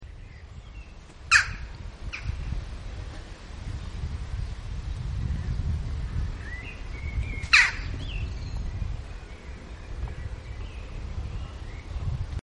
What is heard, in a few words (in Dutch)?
Kauw Coloeus monedula Geluid; Annelies van Klinken